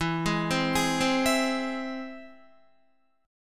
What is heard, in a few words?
E Augmented, Guitar; E+ arpeggiated; JGuitar